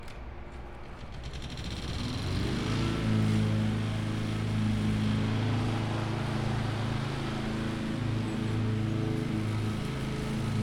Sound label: lawnmover